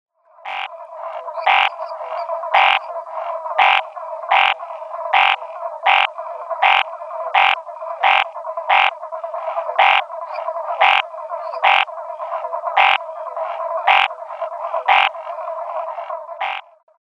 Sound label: Scinax fuscovarius